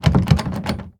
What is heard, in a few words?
wooden door locked